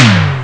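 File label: GMC snare drum; Amiga Soundtracker Sample Packs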